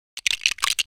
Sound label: smash